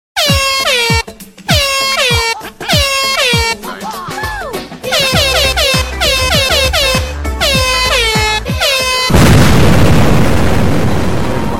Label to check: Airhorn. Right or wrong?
Right